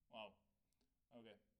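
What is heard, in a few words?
SNR Top, snare top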